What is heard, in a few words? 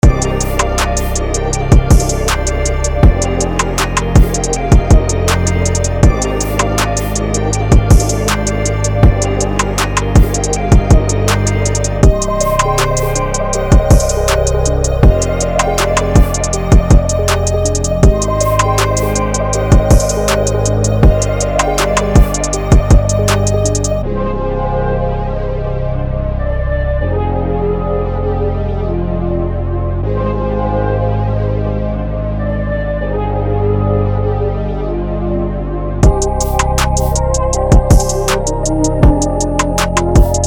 Dark Hip Hop Loops